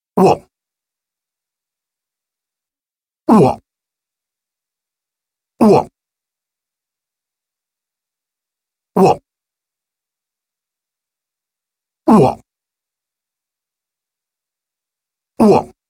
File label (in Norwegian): Stor elgokse